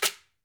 AMAZON SHAKER, Latin Shakers

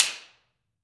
SLAP STICK

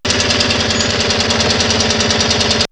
Manic Motor; X-Static Goldmine

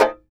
TAR; Roland - LA Composer vol. 3

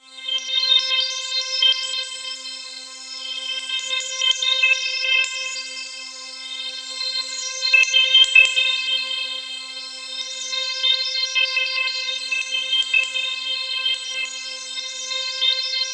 C4, Roland JV 2080 TECHNO PAD

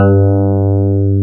TX 802

